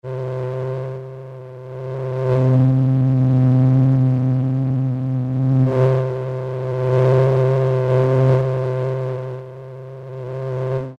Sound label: FlyBy, Yamaha TG33; Factory Presets; Preset bank 1